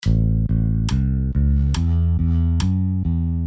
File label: Bass Tabs Exercise